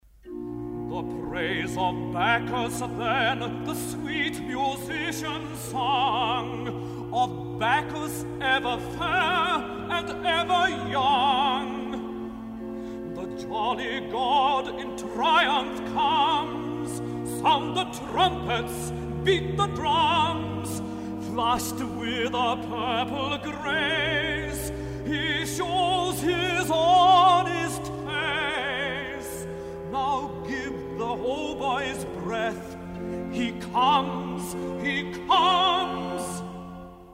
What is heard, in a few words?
sung; the praise of bacchus; then the sweet musician